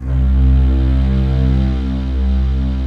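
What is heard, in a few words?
strings vox C2; VOXSTRING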